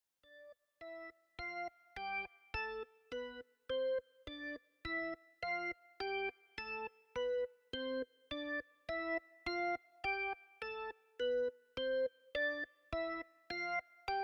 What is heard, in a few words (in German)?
eine zweite Skala, die eine Oktave tiefer liegt, langsam eingeblendet wird, während die ursprüngliche Skala ausgeblendet wird; shepard; Skalen